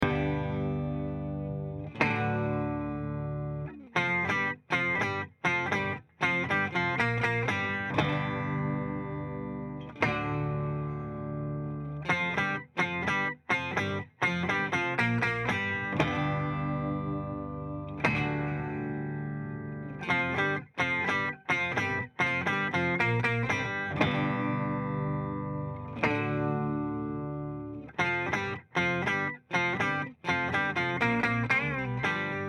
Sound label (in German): Sub N Up octaver gitarre clean classic; tc electronic